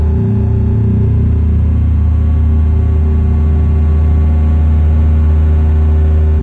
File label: data transmission loop; ambient; source engine; episodic